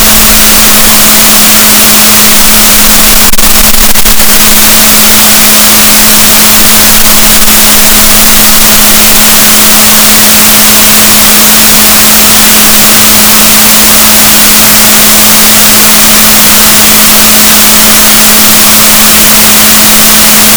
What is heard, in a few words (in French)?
rorquals positif, gaussian noise, Balaenoptera Physalus Chile